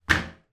toy suction pad drop into bathtub

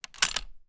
gun mosin nagant; still north media

